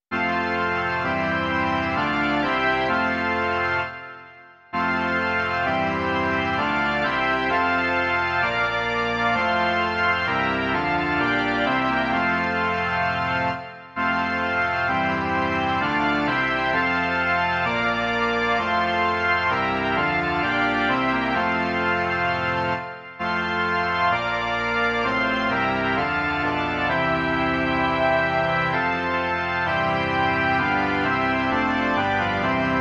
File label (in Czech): varhany